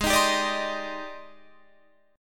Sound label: G sharp Major Sharp 11th, Guitar Standard; G#M#11 strummed; 16,18,17,19,16,20; JGuitar